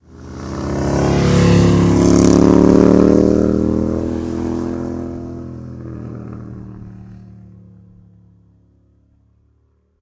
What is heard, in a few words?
motorcycle pass-by